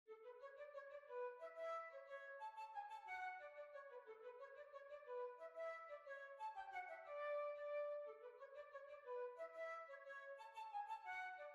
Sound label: Jig